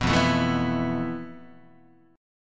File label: F Major 7th Flat 5th Guitar; strummed